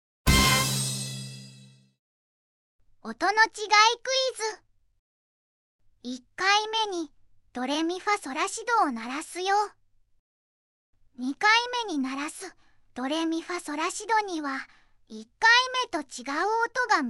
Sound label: quiz doremifasolatido